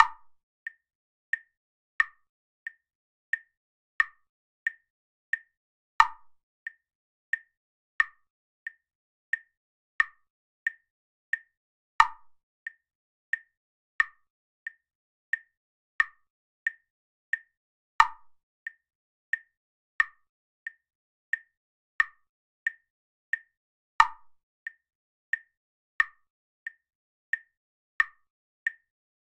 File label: 9/8 at 90 bpm; Metre (music)